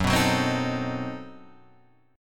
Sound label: B 7th Flat 9th, guitar